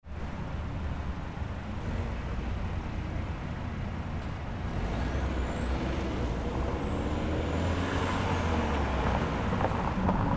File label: Street Ambience